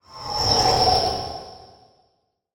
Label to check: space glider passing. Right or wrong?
Right